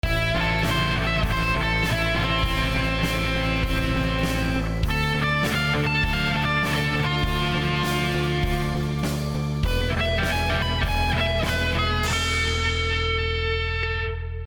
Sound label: Neoclassical Etude Live Instruments